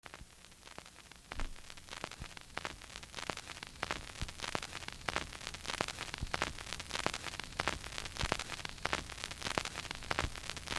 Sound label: sounds of tropical noise forest; a dontigny